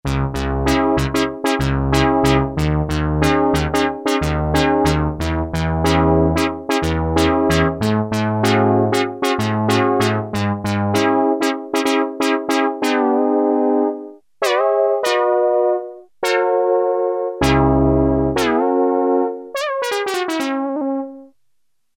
Synth Ens, Casio MT-600; Tones

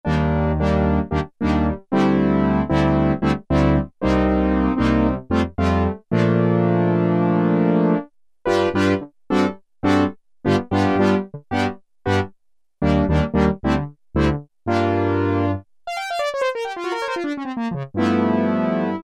COMP HORNS, Oberheim OB-8; Factory Patches

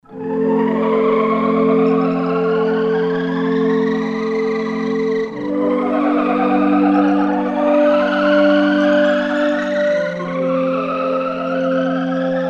Dark Castle, Korg MSC-16S; Environment

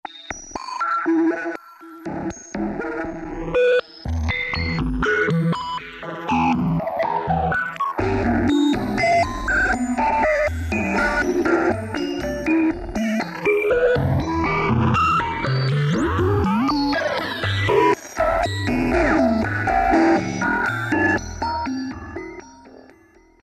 S&H Overload, Roland JD-990; AKS S&H Overload; Vintage Board

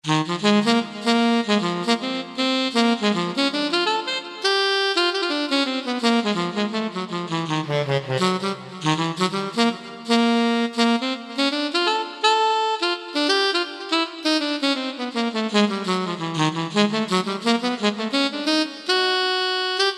solo sax; Generalmusic S2R

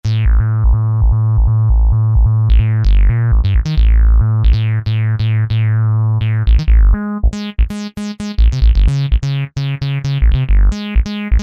303 Bass, synth

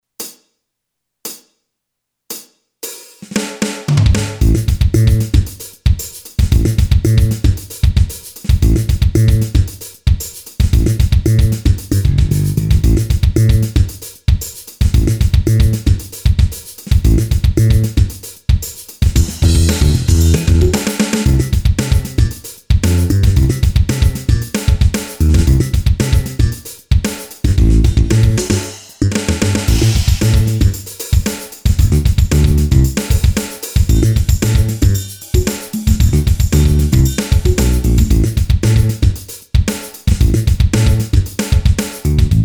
FUNK, Boss DR-3

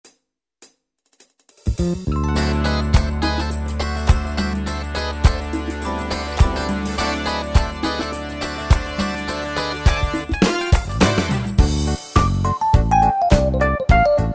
Kool Funk, R & B; Yamaha PSR-3000; Factory Styles

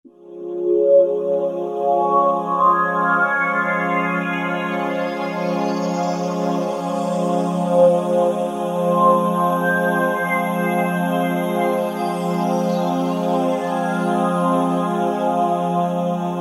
Overtones, Roland V-Synth; Factory Patches

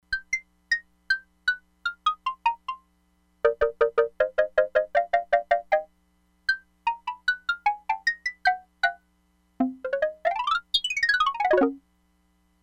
XYLOPHONE, Crumar Bit 99; Factory Programs

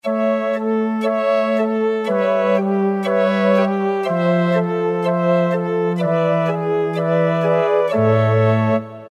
Flutes Ensemble; Roland PN-D50-03

